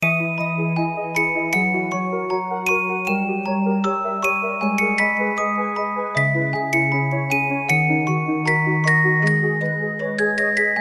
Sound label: sad tune, ringtones